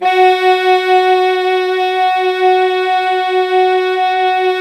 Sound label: SAX ALTO; Roland - LA Composer vol. 1